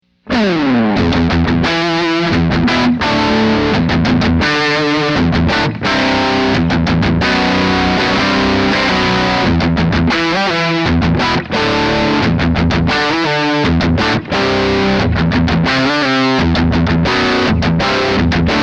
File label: cutting crunch